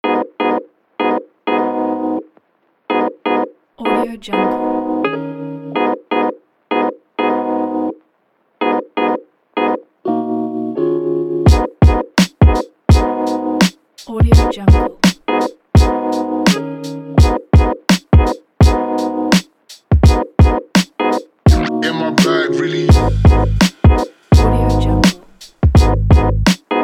hip hop

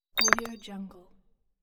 treasure gemstone

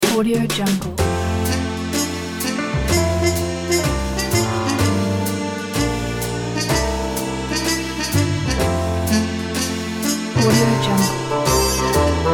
big band bounce; kerplunkstudios

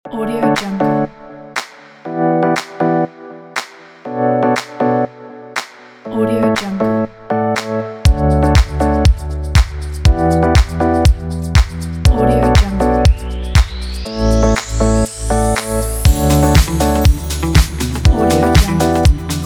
upbeat fashion lounge

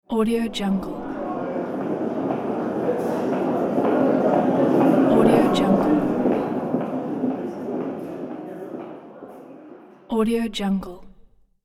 people ambience blur

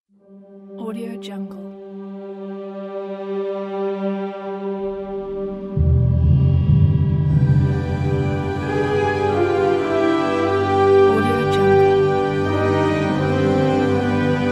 classic hollywood adventure movie logo

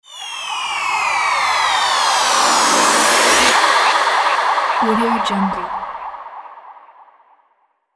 ufo flying; logoprod

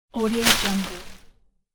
falling on oak tree branch

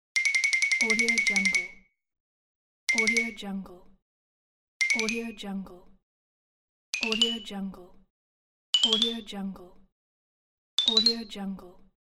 cartoon tiptoe

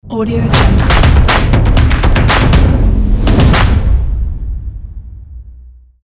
epic stomp and claps logo

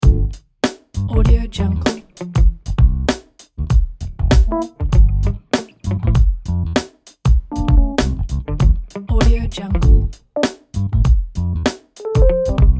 funky loop

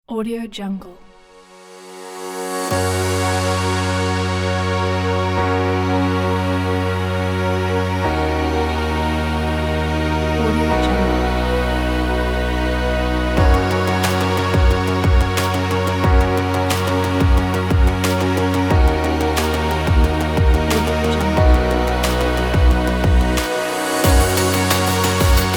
pop inspiration kit

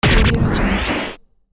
scratch

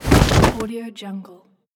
a big body falling down

